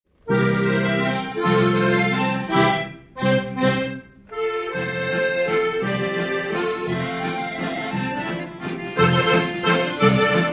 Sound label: Junior Waltz, accordion band